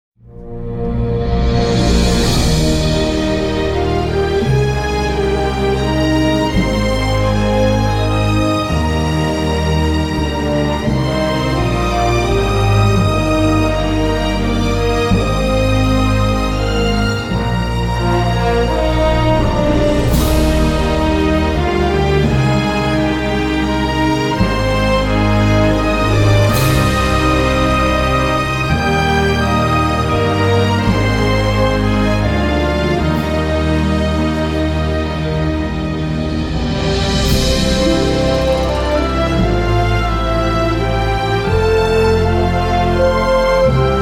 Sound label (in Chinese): 震撼音乐